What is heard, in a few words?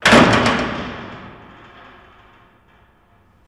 Jail Door Close Ratt; jail & prison doors